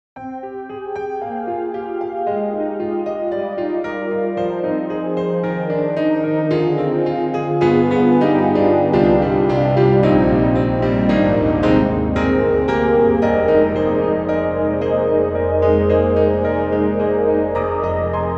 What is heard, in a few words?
Grand Pianos German D Ambient Piano; Synthogy; IvoryII